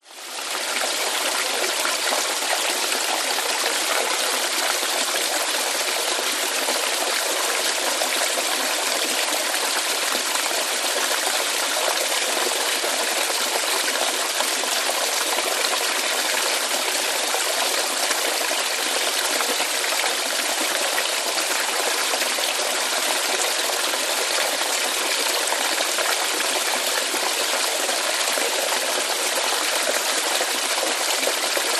nature waterfall flowing down rocks creek rainforest